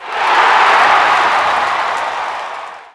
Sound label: roar; TrickStyle